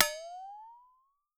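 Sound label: LARGE FLEX-, FLEX-A-TONE; Voice Crystal - Steve Reid Definitive Percussion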